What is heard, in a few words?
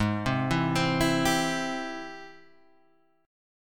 G sharp Augmented Major 7th G sharp, guitar; G#+M7 Chord {4 3 2 5 5 3} arpeggiated